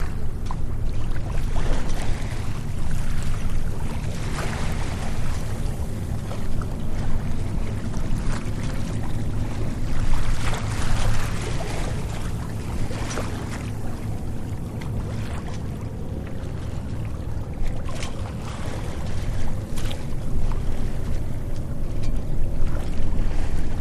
Harbor Water Lap

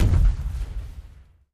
GRENADE EXPLOSION, small

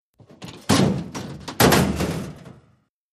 Junk Slams Metallic

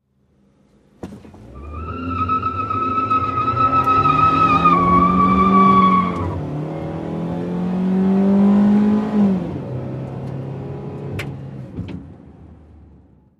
Sm Car Accel Peel In, compact

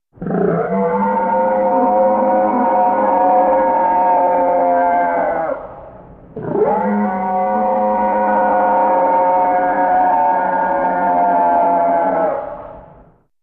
Creature Howls, monster

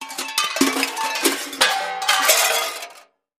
Junk Thrown Junk Pile, junk crashes

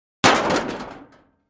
Aluminum Panel Dropp

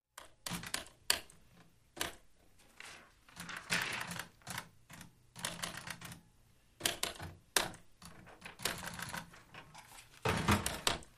Office Background, classic bg

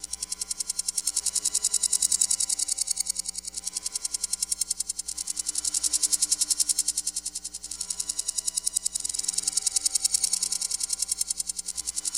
Clicks Multi Tapped

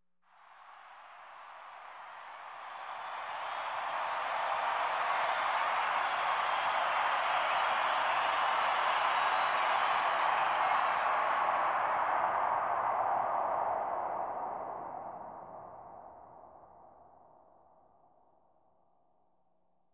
Arena Crowd Collaps; sports arena

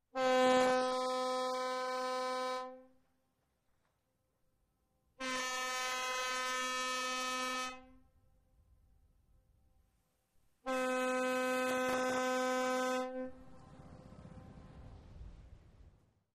Very Close Boat Horn, ferry

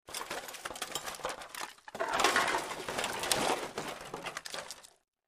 Wood Search Through Garbage or Junk Pile

